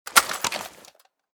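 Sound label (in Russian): солдаты кино и телевидения улавливают звук оружия и пистолетов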